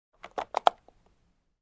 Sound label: Vintage Camera Shutter Sound